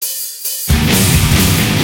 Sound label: reel Spingle Start